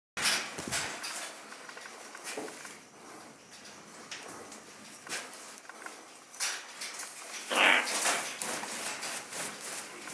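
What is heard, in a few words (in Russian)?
zelenokrylyi ara ara chloroptera ruchnye ptency; iz pitomnika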